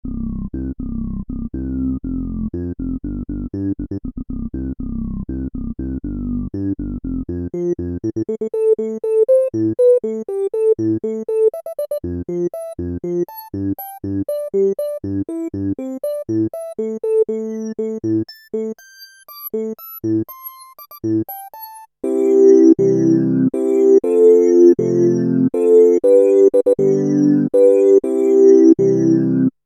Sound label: e-mu virtuoso 2000 filter acidravage rez 12pole q100